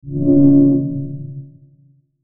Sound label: Logo Low Tonal Swell; Sonic Logo Sound Effects; Blastwave